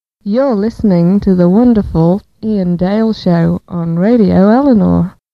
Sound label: Jingles